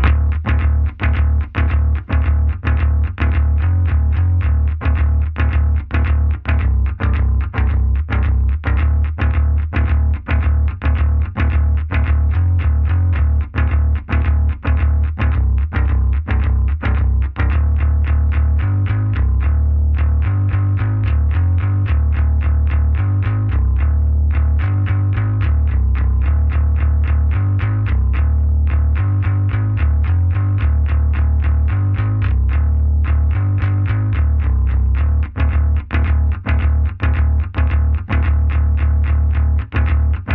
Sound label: Instrumental Bass; nowhere station